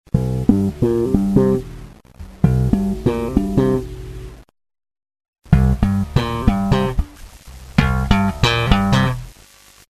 bass booster slap

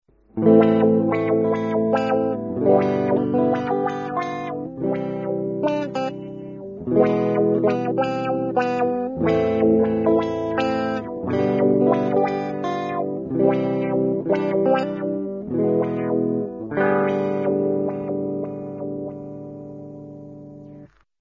Morley PWA Wah

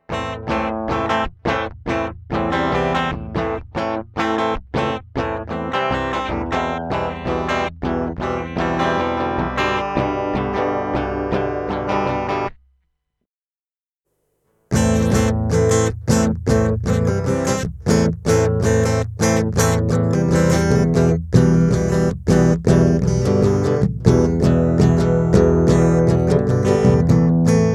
Boss AC-2; ohoo owl

